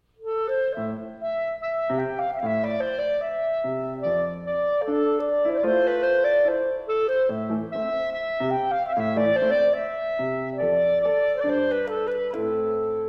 Hebraic Music for Clarinet; II. Suite Hebraic - Mvt. 3. Dialogue

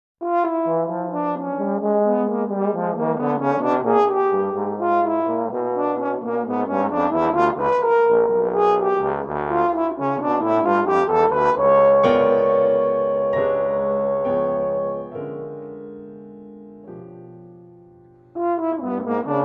for French horn bass trombone and piano; 1. Paris 1899

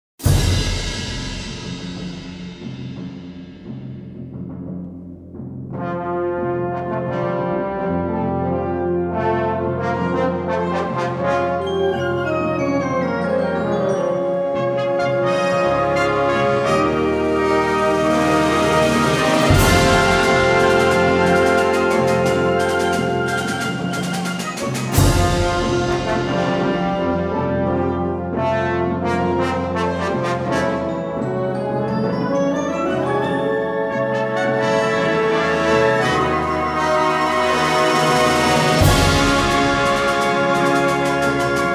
Commemorative Fanfare

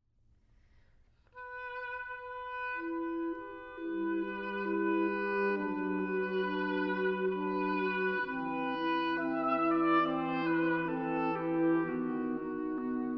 Dance Suite for Woodwind Quintet

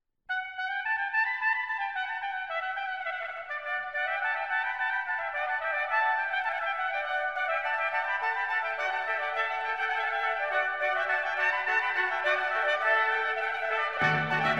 Canon; Canon de Versailles

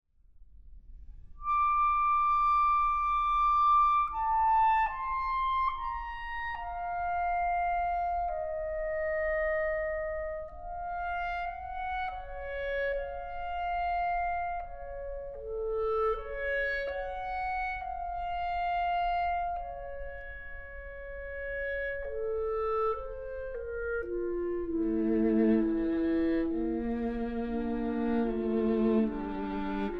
Trio for Clarinet, Viola, and Piano, Adagio molto; Bruch Trio

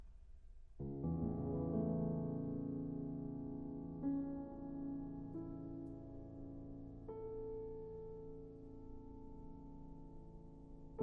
Piano Sonata in D Minor, Largo-Allegro; Beethoven Works For Piano

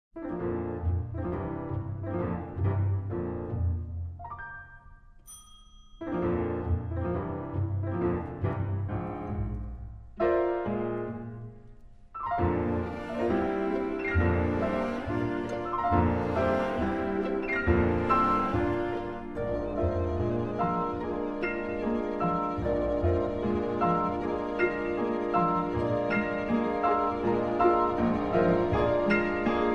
Concerto for piano and chamber orchestra

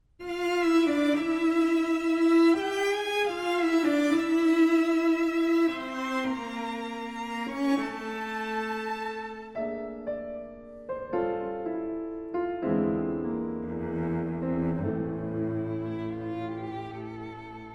Piano Quartet in A Minor, Lento

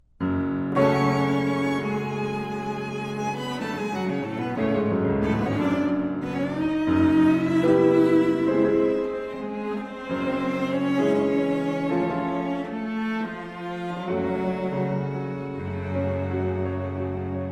Phantasy Quartet in F# Minor

